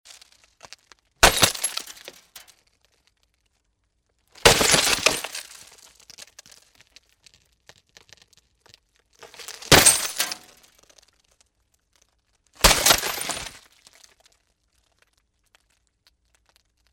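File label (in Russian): разбивают ящик со стеклянными вставками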